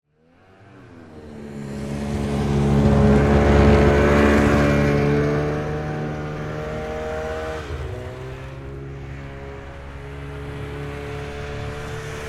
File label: Snowmobile Activity, Sports, Snowmobile, Activity, Yamaha, Exciter, 440; Miscellaneous Sport